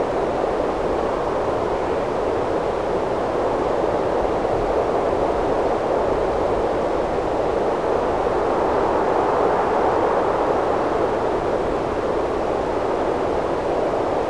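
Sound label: day loop; ambient soundscapes; dt radiant; dota; diretide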